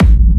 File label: Techno Kick; 30 Techno Kicks 2025; Ghosthack